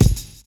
JAZZY FIL; Univers Sons

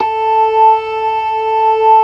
ORGAN LOWER MANUAL

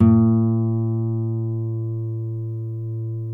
NYL NV B, nylon no vib guitars; Spectrasonics; Hans Zimmer Guitars VOL-1

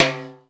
HED P TIMB; 90 sSampleCDs; Crypton - DrumBase5000

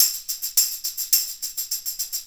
Tambourine

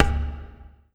WD L, DRM HITS; Spectrasonics; Liquid Grooves

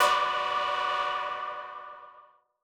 M Dynamic Tech B3; KG MegaSaur

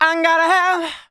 vocals sung F#; Techno Trance Essentials

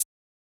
Maracas, Yamaha DJX 2B

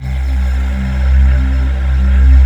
COOL VOX C1, COOL VOICE; AKAI S-Series CD-ROM Sound Library VOL-7

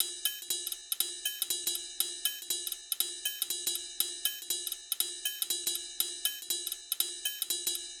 Rd Tr Loop 120; Hh-Shake Loops 120; Equipped Music; Loungin House

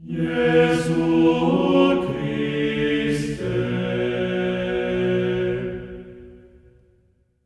JESU B3, Symphony of Voices; Spectrasonics

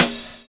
analog fi; Univers Sons - Kitch 70s

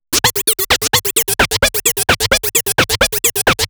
Android Arps 130BPM; sci-fi; Loops

